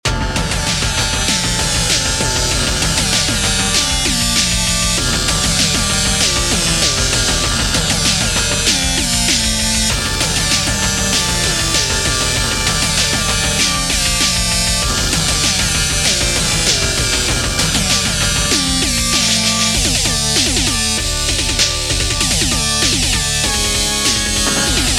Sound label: boss cavern